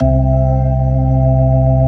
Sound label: B3 ORGAN; E-MU Formula 4000 Series Vol. 2